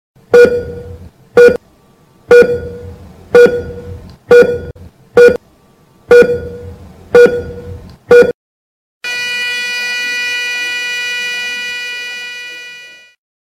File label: Ekg Heart Beat